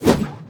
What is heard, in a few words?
sword